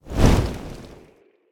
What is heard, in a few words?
idle flap, phoenix; smite